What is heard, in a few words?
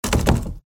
door lock; Poppy Playtime